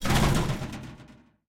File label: shootrattle end